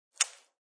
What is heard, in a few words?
Rip of masking tape; Offices